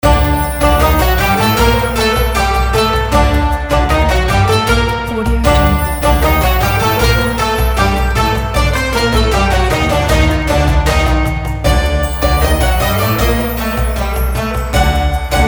ramadan happy

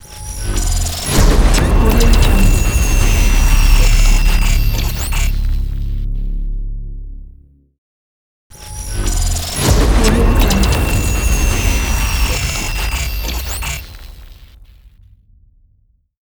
glitch logo

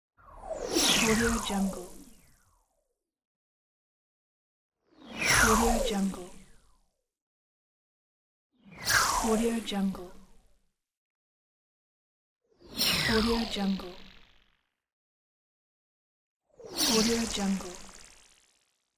whoosh crumbly

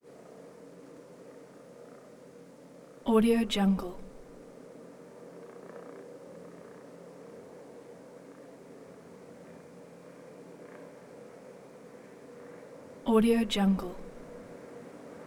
race car long approach distant pass by away; prosoundfx

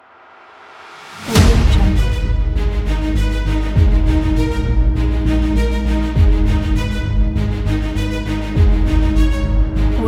the epic teaser trailer

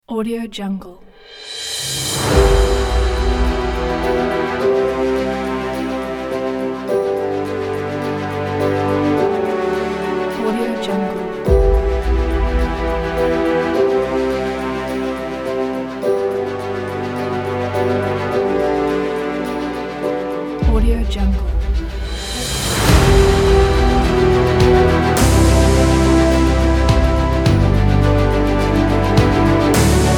epic kit

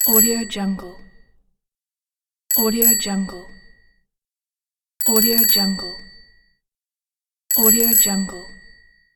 bicycle bell

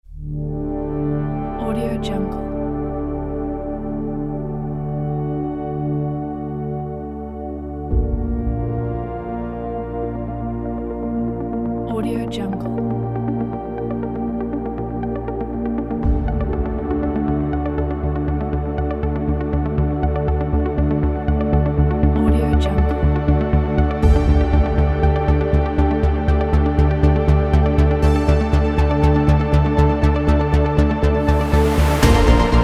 epic scifi trailer

